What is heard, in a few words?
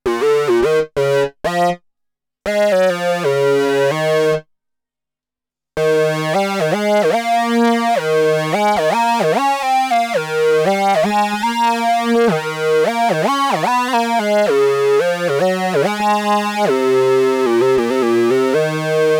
MOOG